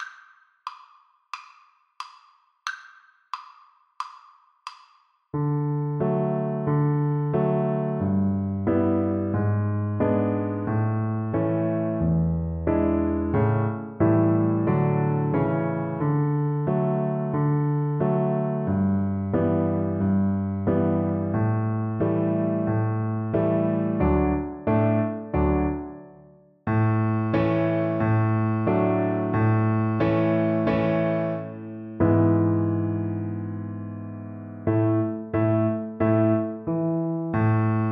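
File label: viola karaoke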